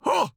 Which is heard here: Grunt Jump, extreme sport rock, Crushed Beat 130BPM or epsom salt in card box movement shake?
Grunt Jump